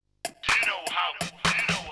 PERC LOOP, House, Loop; eJay

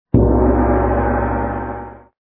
gong; maya miguel global groovin

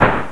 Kill Special; Bedlam

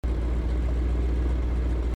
Ford Transit; red cross eru

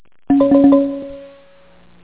kalimba

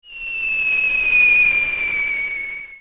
Artillery Going Long; 1944 Battle Of The Bulge

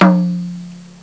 indian drum, percussion instruments; Sound Effects for Multimedia - Volume 1

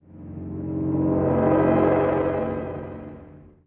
Spaceship bg; GameAudioProgramming

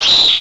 Spider Scream; Swoop

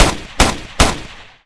rpk74; The Sum of All Fears

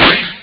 smash, drums; OctaMED SoundStudio V1

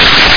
scrape

